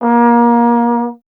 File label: Trombone A#0; Miroslav Vitous - Solo Instruments VOL-1; 90 s Sample CDs